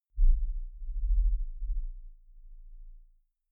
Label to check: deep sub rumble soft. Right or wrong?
Right